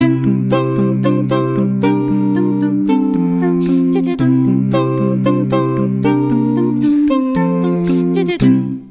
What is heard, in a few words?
Uplift Sound Effect Acapella